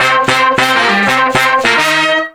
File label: Horn Sect Effect